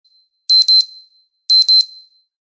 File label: 2 Beeps